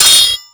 SW HIT, MORESWORD; Total Control - Action Cutz

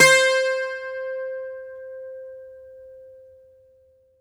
GR. BAZOU, Plucked Strings, Greek Bazouki; E-MU Producer Series Vol. 7 – Old World Instruments

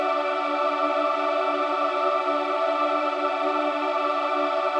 Pad Loop D, shimmer and sparkle

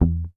P BASS MUTES E2

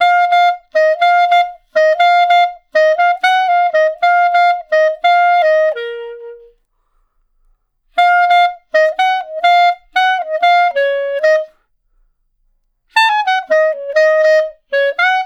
Clarinet One Shot, melody; Jazz Solos and Sections; Traditional; Clarinet Melody One Shots

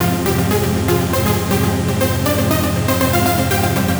Trance Synth

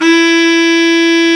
BRA BARI, Bariton Sax

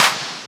Claps; Vengeance; Essential Clubsounds Vol.4

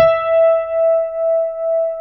CP70, Keyboards Old School; USB Soundscan vol.09; AKAI

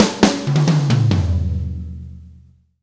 Fills, Monster Beats; Zero-G

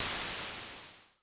Dual-Percussion-Synthesiser; Klone

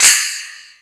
Crash, Drum Machines E-MU Pro-Cussion

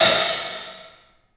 HAT, CLUBHAT, 3D Drums; 3D Sound

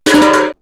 Metal Crash, perc fx; X-Static Goldmine

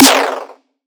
FX Snare; Antidote Advent; Infected Track Drums

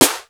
MPC 60; Rubber Chicken Software Sample Bank; DRUMS + PERC; AKAI

